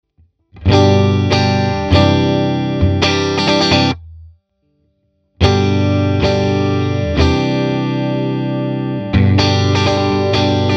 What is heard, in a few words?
Universal Audio MAX Dyna Strumming